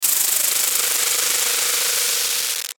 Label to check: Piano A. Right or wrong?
Wrong